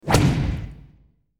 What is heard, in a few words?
big whip stinger; hollywoodedge